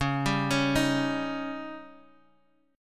C sharp Suspended 2nd Flat 5th C sharp Guitar Open G